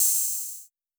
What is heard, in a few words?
Smoother hi hat, Open hi hats